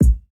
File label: kick, house